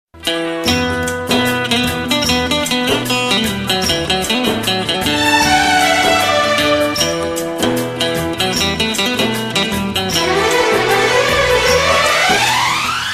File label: Romantic